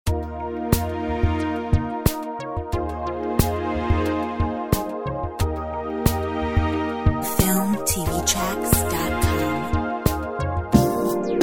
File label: lounge